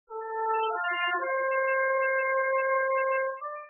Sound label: trpt